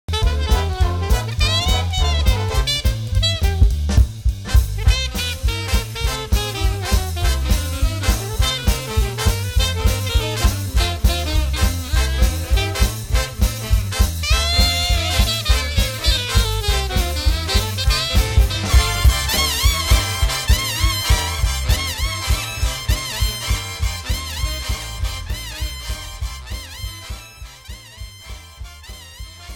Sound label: BackBeat